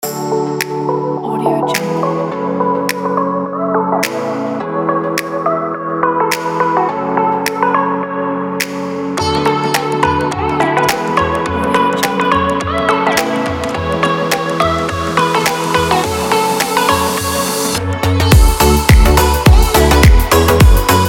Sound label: summer travel; alexiaction